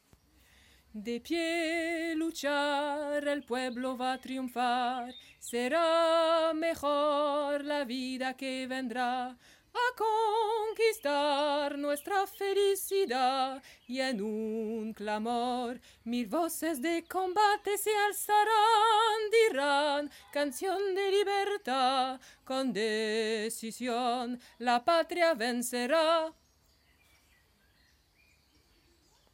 voix basse

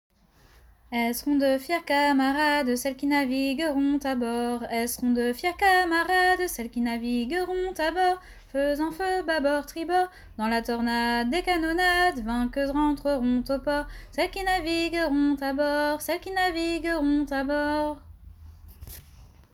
Voix haute

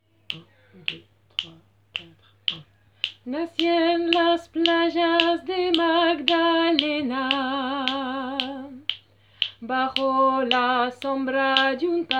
voix medium

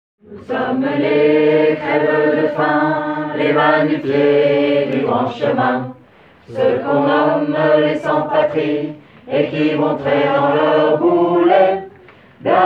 voix lead basse

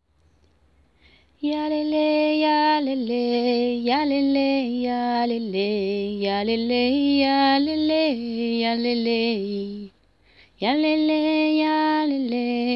Voix principale

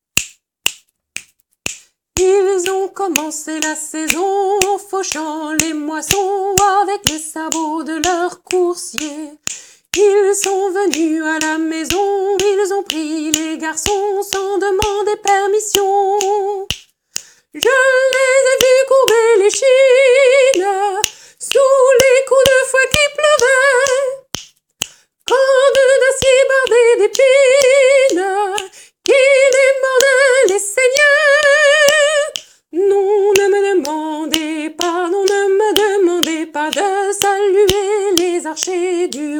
aigue sol

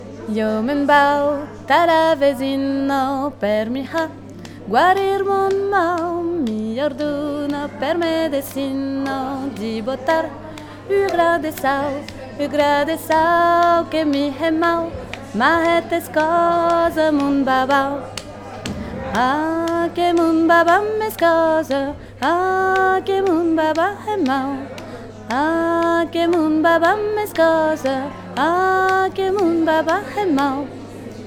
Voix haute

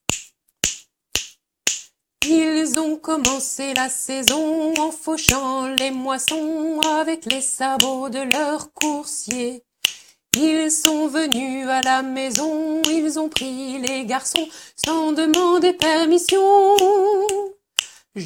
medium mib

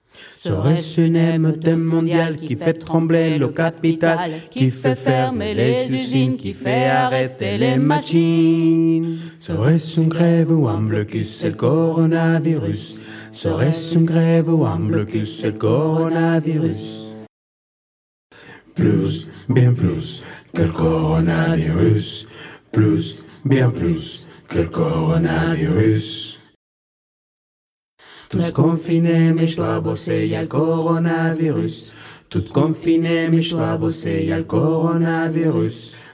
VOIX BASSES ténor baryton basse

